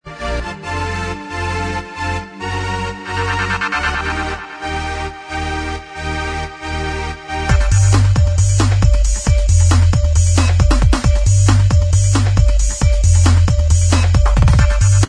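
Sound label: instrumental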